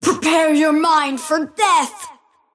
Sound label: cast pain; Invoker